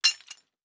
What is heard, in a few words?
Glass Shatter; Survival Game Sounds - Lite Edition